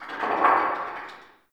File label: Fort Corner Tower Stone Creak; Ambience